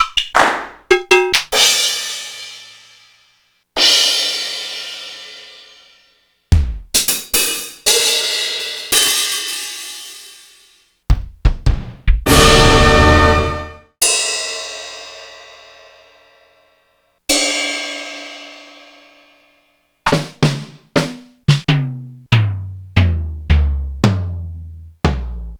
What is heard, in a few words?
Akai XE8